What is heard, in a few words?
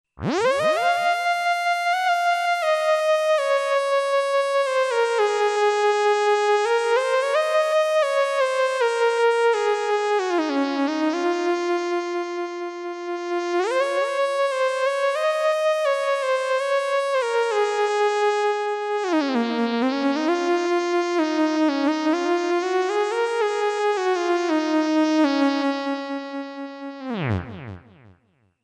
moog theremini spin cycle